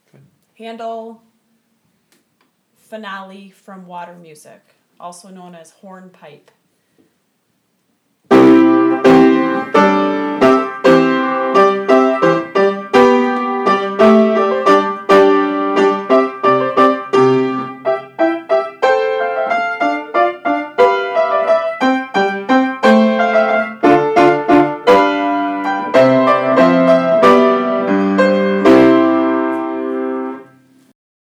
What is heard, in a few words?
hornpipe